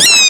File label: bat death; Indev+ 0.3 Portable